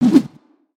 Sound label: jump; creatures organic